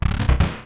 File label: door; Super Mario All-Stars (SNES); smb3